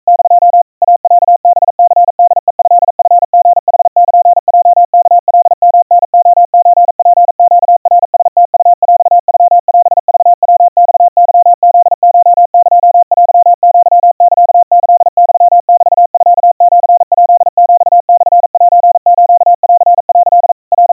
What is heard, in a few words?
Morse 140 cpm 700 Hz Crispy; iroha